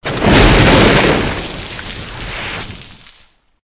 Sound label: throw storm cloud